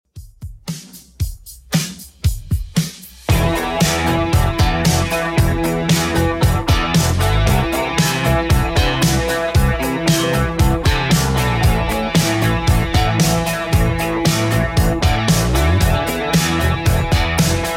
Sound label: 80s Redrum, 115 bpm